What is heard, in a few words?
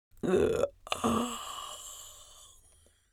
Female old woman yawn; yawn sound effects